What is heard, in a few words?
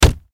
Impact Human Body Punch; Human Body Hit Hard Punch; Bodies; Sound Effects:Human; Blastwave